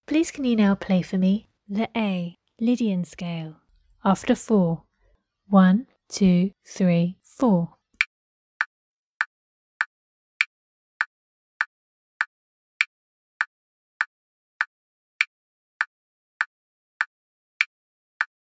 A lydian scale

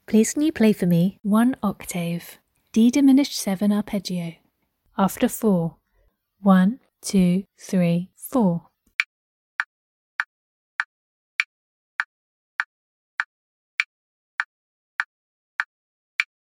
d min 7 arp

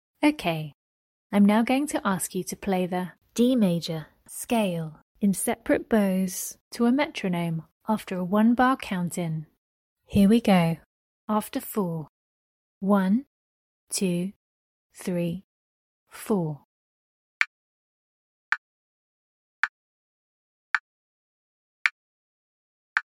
d maj scale sep bows

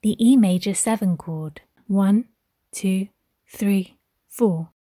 E maj7 chord

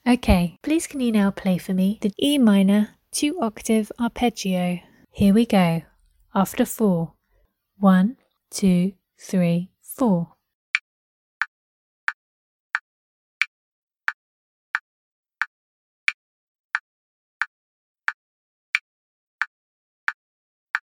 e minor 2 oct arp

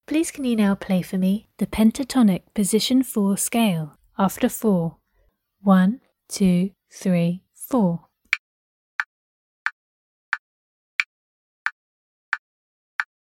Pentatonic position 2

